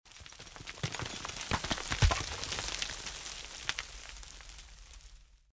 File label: short rock; Dystopia; meatspace